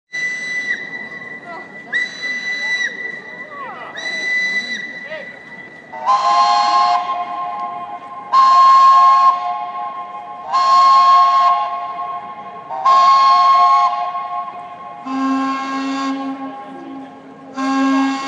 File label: tugboat techno